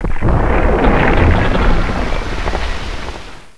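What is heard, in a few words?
crumble; duke nukem total meltdown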